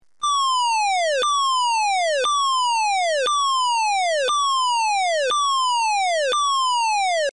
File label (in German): feueralarm